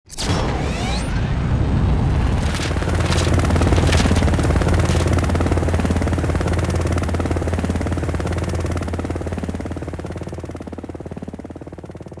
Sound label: takeoff 3d; buraq